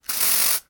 household aerosol can spray; sound effects one

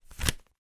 household game pamphlet place into case; nintendo ds

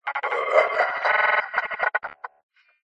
horror evp ghostbox demon laugh glitchy receiver

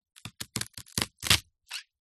leek slice knife on wooden chopping board; food

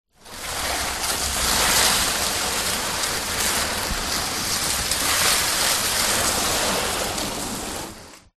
cardboard box large slide garage floor long; foley

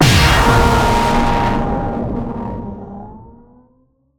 Impact Static Blast; bottlerocket

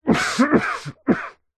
human male man cough into cloth or arm muffled; zapsplat

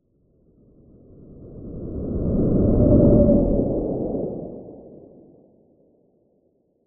science fiction spacecraft fly by fast

